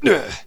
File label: pain; assassin